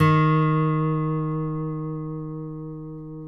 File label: D#, guitars; Spectrasonics; Hans Zimmer Guitars VOL-2; FNGSTYLE PFM